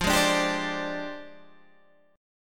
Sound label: F Minor 6th Add 9th, Guitar, Open G